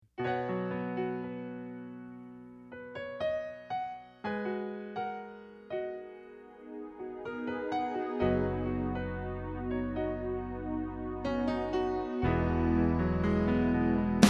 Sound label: Rock Ballad Play Along